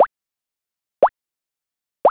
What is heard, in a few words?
glug